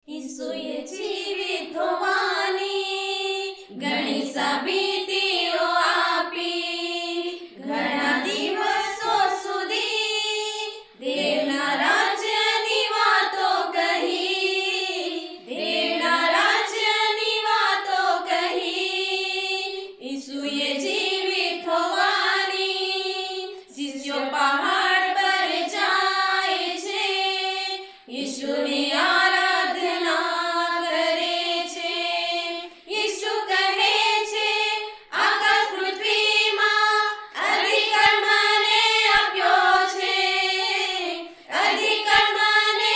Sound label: Song, Gujarati; મહાન આદેશ; Great Commission